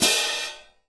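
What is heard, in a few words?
cymbal, Orchestral; ILIO; Synclavier Percussion 2; World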